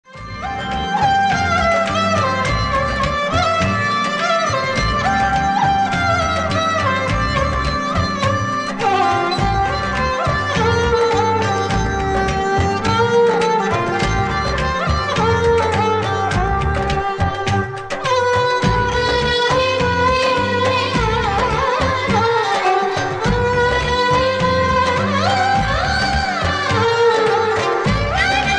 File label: Indian Tamil song ringtone